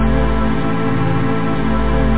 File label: String chord; Instruments